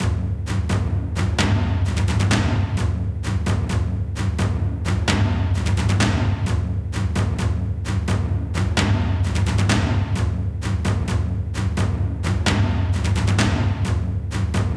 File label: Drums Cinematic Energy Toms 130